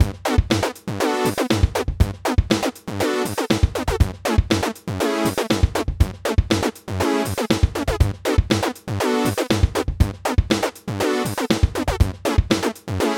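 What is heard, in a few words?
saw riff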